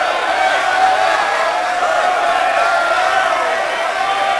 Small Crowd Unrest; David Beckham Soccer; XBOX

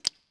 drip; school; Tony Hawk's Project 8; XBOX; inschool

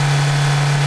Saturn 3500, Engine; Cars; XBOX

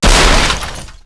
mercedes hits truck; Max Payne; tenements

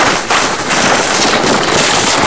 Rock mud, surface; WhiteOut

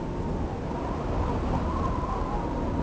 wind; Ambient; MX vs ATV Unleashed; XBOX

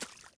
puddle, footsteps

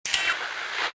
commlink off

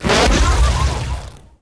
explo sizzly; impacts explos

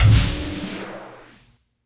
force jump

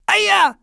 HURT; True Crime Streets Of LA; XBOX

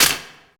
Rifle Ricochet; projectiles; Max Payne

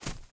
thug fs grass, footsteps

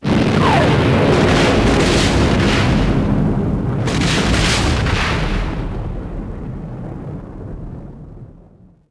explo long; impacts explos

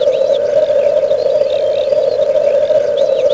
bird; bracken

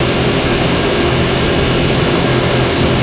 engine; Star Wars Knights Of The Old Republic II; XBOX

